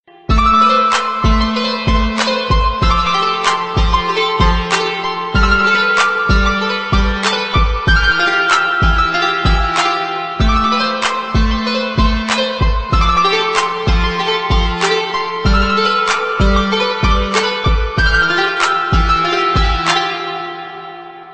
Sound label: best piano ever